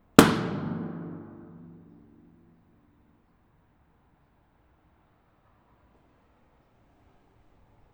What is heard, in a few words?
reverb breezeway; pomona; edmunds